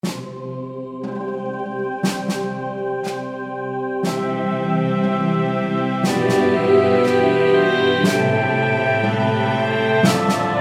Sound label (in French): Jingle de messe